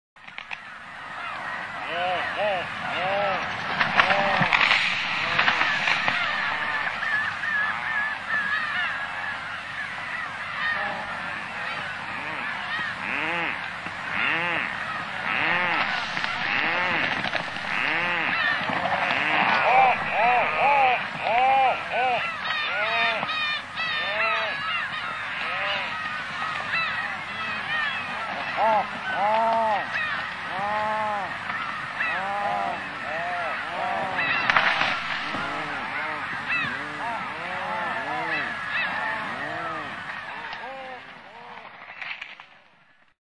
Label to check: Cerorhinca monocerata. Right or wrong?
Right